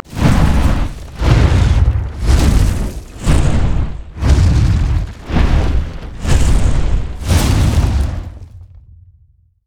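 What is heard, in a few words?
armadillo tremors; rammus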